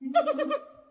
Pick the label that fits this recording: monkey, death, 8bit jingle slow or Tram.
monkey